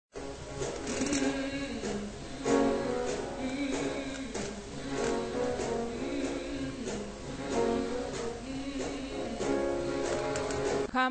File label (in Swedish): bas, stammor